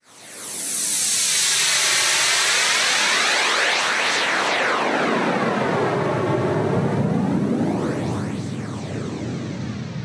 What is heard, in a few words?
Downlifter